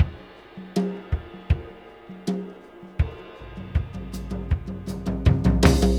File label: Tom Groove, Laidback; Sonic Foundry (Sony Creative Software); Drum Tools